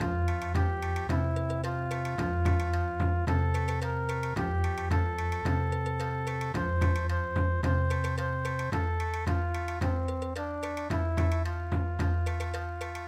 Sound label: Bon Odori, midi